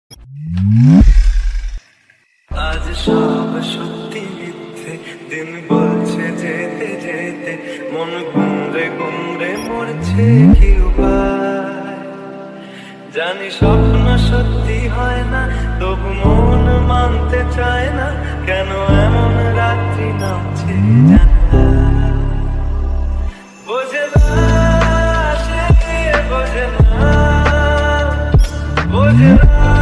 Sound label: sad song ringtone, hindi ringtone